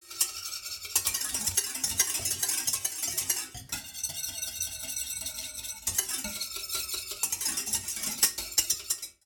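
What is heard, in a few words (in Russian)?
перемешивание в металлической посуде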